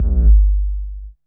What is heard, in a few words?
3D K808, 3 D AMBIENCE